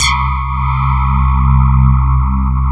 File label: CMI PIANO; East West; Twisted Textures-BT